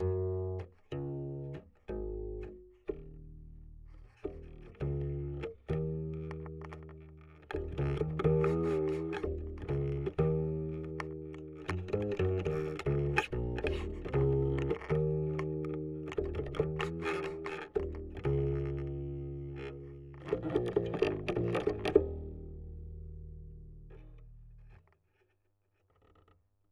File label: bass test, Electric Upright Bass